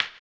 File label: HAMBONE, Vocal Blues Soul; Spectrasonics; Planet